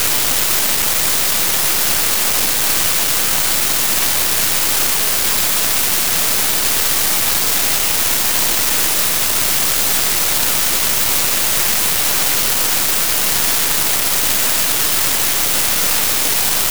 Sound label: white Noise